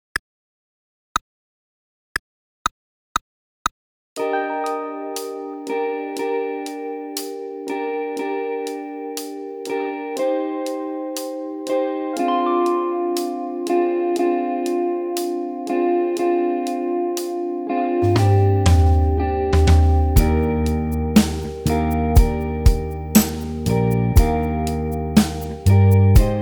Tuba Playalong; Tuba Fun

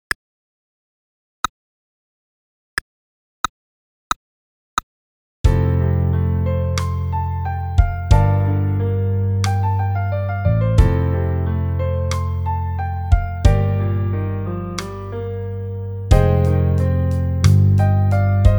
trumpet playalong; trumpet fun vol 1

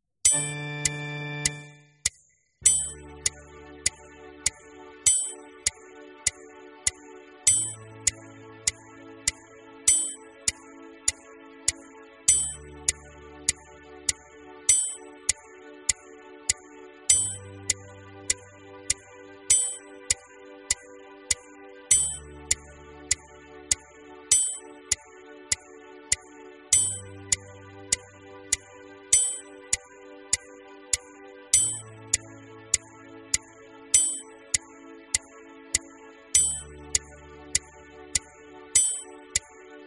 Warm Up D, warm-up exercises; Playalongs zu den WARM-UP EXERCISES; otmars warm up exercises

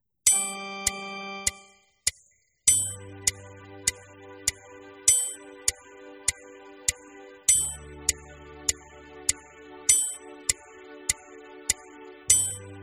Warm Up G, warm-up exercises; Playalongs zu den WARM-UP EXERCISES; otmars warm up exercises

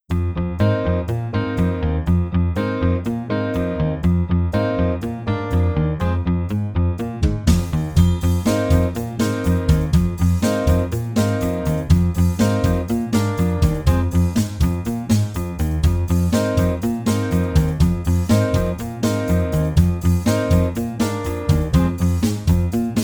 Playalong hoch, horn; easy beat vol 1 horn new

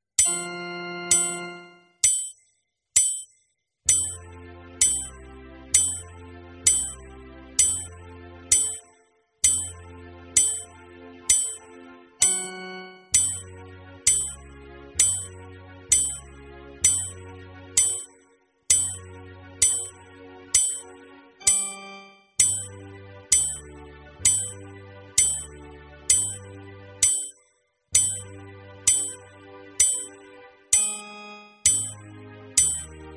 warm up exercises; Playalongs zu den WARM-UP EXERCISES; otmars warm up exercises

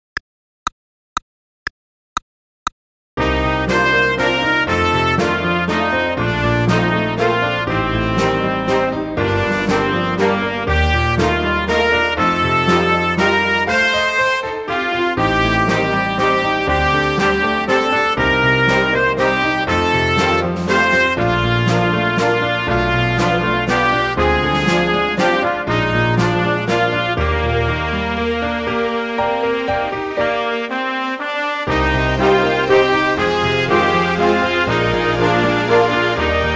Trumpet Walzer Melodie; trumpet fun vol 1

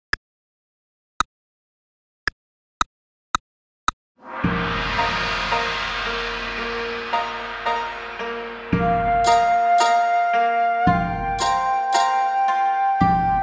Playalong, trumpet; trumpet fun vol 1

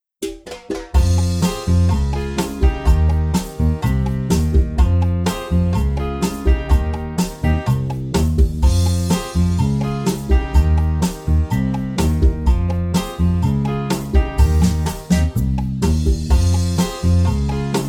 Playalong, horn; hoch; easy beat vol 1 horn new

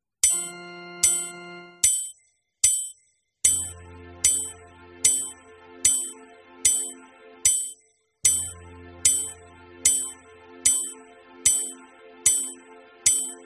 warm up exercises; Playalongs zu den WARM-UP EXERCISES; otmars warm up exercises

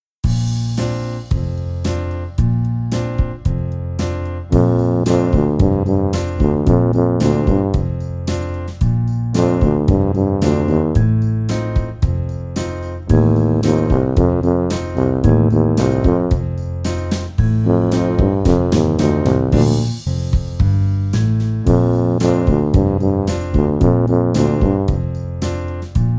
tuba

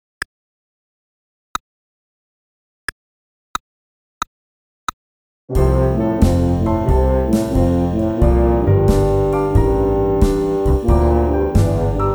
Tuba Melodie; Tuba Fun

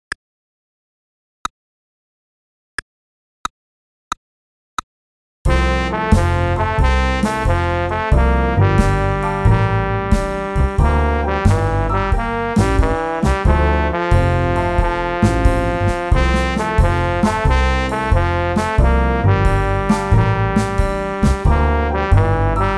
Trombone Melodie; Trombone Fun

